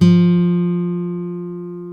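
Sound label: F2 classical guitar; InVision Interactive; Lightware VOL-1; Instruments & Percussions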